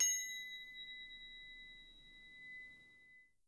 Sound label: B3, Percussion & Harp; Miroslav Vitous - Percussion & Harp